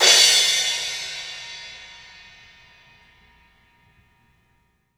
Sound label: 3D Percussives; E-MU Producer Series Vol. 5 – 3-D Audio Collection; P.A. Kit